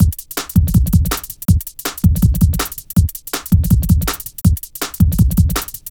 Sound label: 162, breaks, Loops; m8 breaks